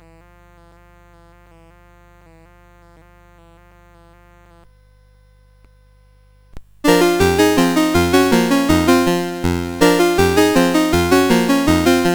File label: Ragtime, CSG8580R5